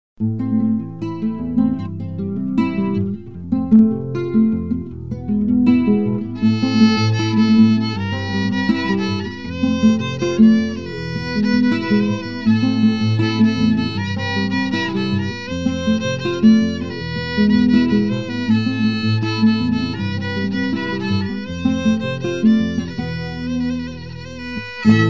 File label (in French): violon; 1 jour de violon